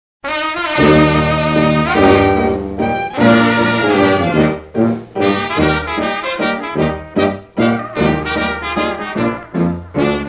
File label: LAENDLER